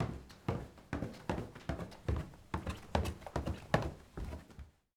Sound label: Stairs Down Wood; Footsteps; HauntedBloodlines